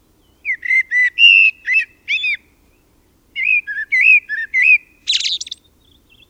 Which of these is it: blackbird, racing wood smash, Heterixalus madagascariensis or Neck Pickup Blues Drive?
blackbird